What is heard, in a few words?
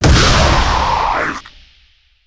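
ghost death, monster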